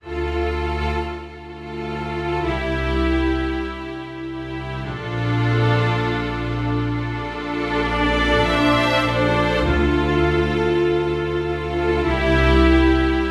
full string mix

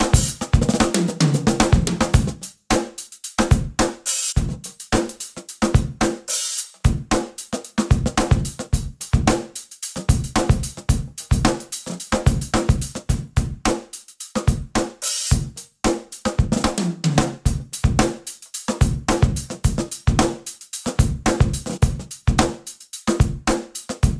tr7

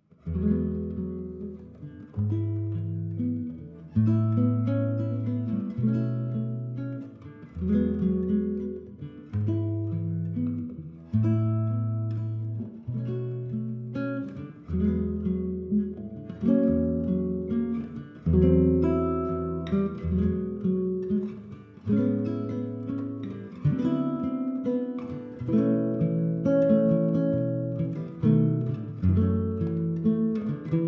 ballad gtr

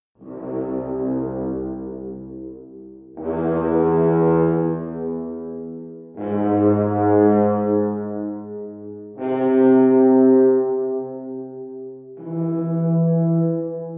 pure space ensemble; french

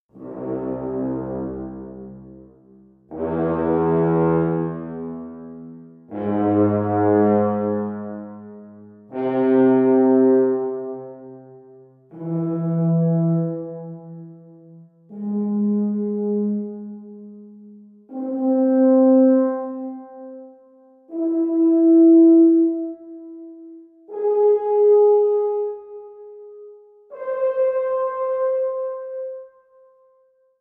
pure space classical reverb

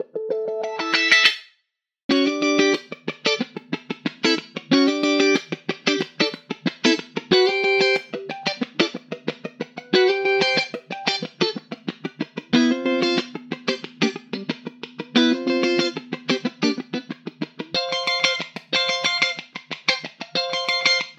electric guitar bright

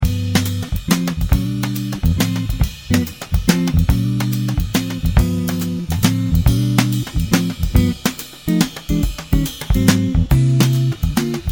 kick n jazz

